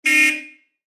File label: Honk; SoundBirdPuzzleSection